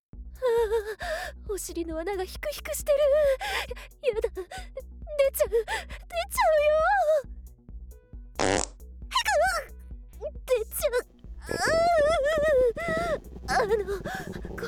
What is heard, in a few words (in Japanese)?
排便、踏ん張り、絶叫ダイジェスト 効果音BGM入り